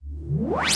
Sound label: Rev Laser; Roland SPD-S